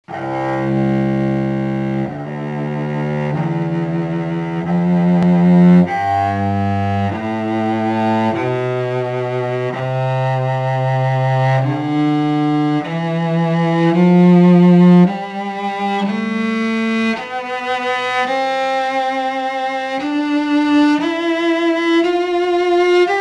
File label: cello; reimund; cassini